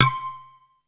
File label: PERC C5; SEQ AND PERC; Music Store - Claudius Diemeter Schwerlos